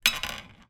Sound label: wood squeak creak; foley; zapsplat